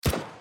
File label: MP5